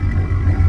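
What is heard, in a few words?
Tank Turn loop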